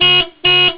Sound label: car horn